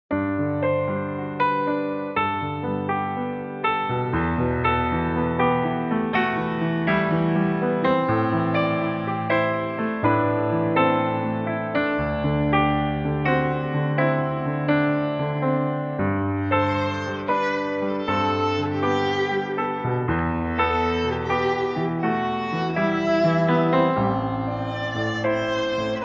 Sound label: cello